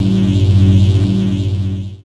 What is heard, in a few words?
ROCK